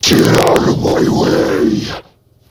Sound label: impact; svencoop; custom monsters; overlordwizard